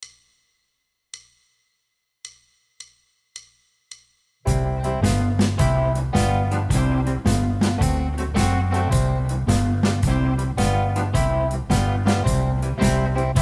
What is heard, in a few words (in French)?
accompagnement c